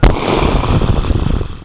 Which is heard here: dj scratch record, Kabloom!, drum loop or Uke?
Kabloom!